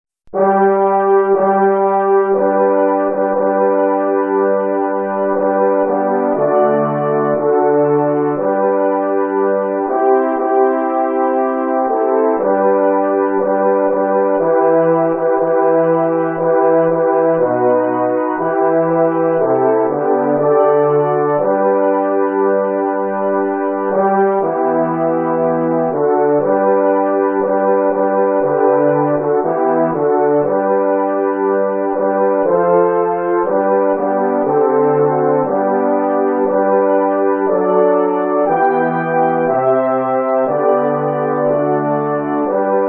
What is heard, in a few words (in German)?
G-Dur French Horn